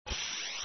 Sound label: Maximize, Windows; Whistler